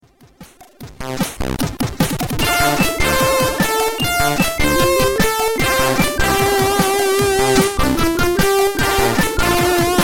Tune; Rambo 3

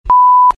Simple Beep Sms

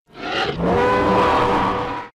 roar

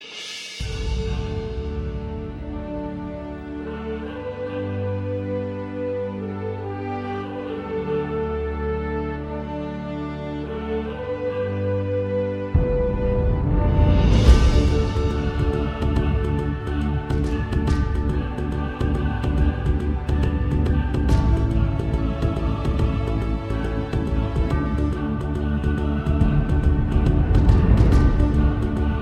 relaxing

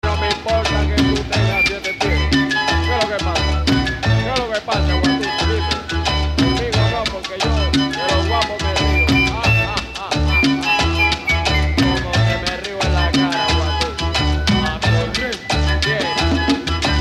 Salsa

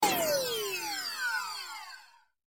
Shutdown Pc

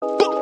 boop

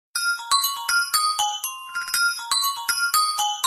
New Nokia E7

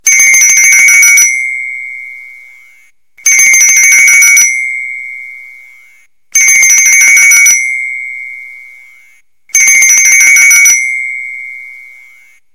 Xylophone; BLU

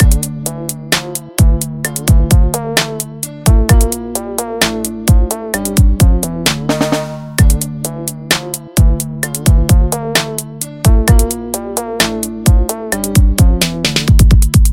trap remix; Spongebob

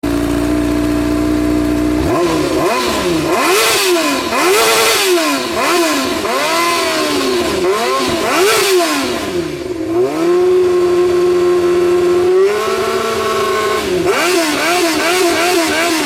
Hornet Akrapovic

